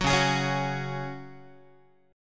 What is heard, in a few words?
Db Suspended 4th Db, Guitar; strummed